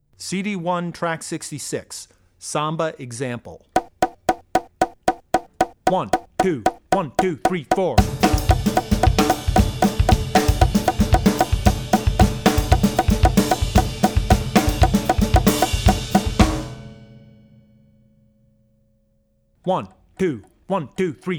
Samba; Drummer's Bible Tracks

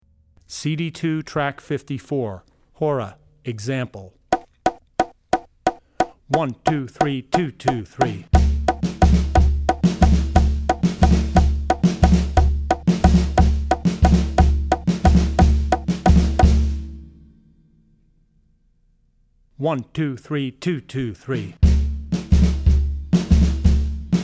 Klezmer - Hora; Drummer's Bible Tracks

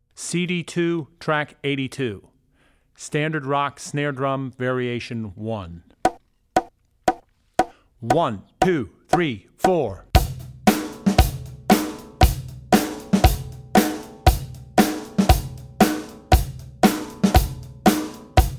Rock N' Roll - Snare Drum Variations; Rock Snare Drum Variation 1; Drummer's Bible Tracks

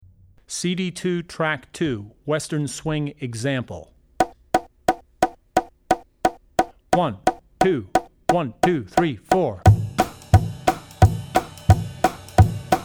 Country - Western Swing; Drummer's Bible Tracks

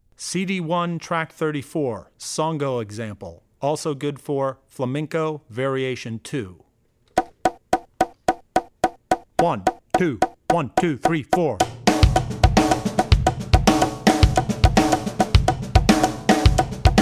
Songo; Drummer's Bible Tracks

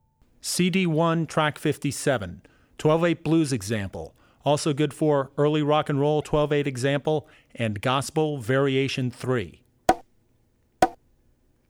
12/8 Blues Pattern; CD1 Track57 12-8 Blues Pattern; Drummer's Bible Tracks

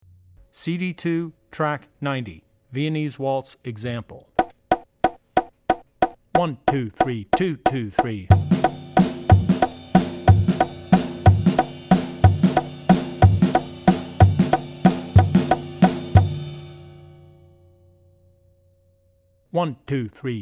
Wedding Dances - Viennese Waltz; Drummer's Bible Tracks

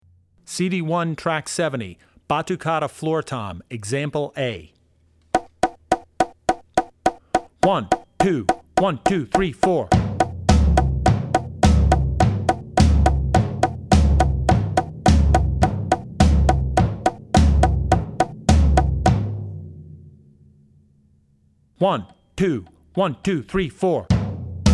Batukada Floor Tom- A; Drummer's Bible Tracks

